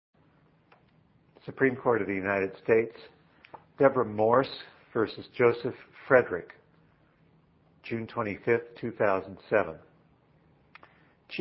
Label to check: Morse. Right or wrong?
Right